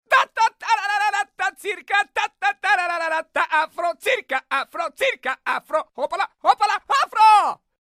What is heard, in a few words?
Circus Afro, ringtones